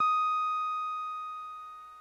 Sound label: ebm, Omnichord; OmnichordGodot